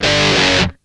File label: interval; Computer Music Interactive 30; Samplenet